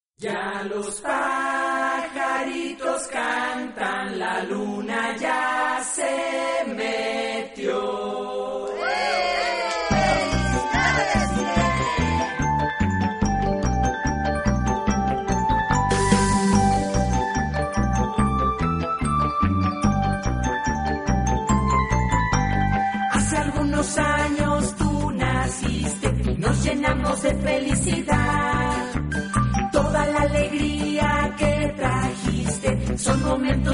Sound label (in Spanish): sirene